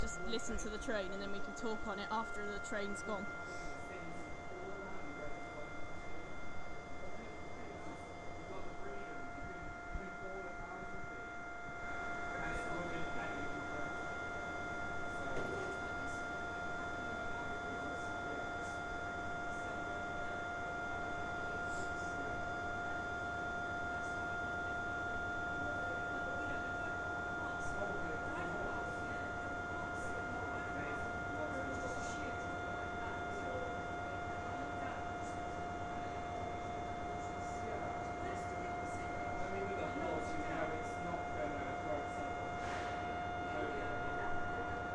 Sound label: train and taxi